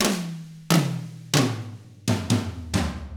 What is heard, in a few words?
SLOW ROCK IN, Drums; ILIO; Double Platinum Drums 1